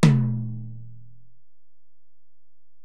Tom, Alesis DM-PRO, Drum Machines, Toms

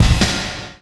Kick Snare; Esoterik Beatz; Saver Kit

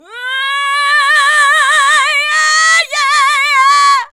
VOX, Singin

